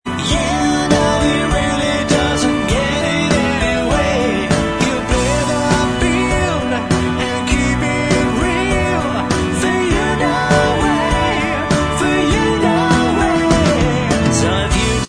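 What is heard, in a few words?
Bvox Track, backing vocals track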